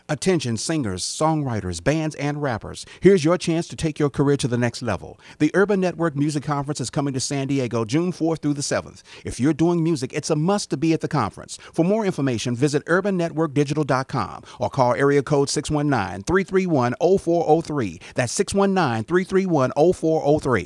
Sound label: Spot singer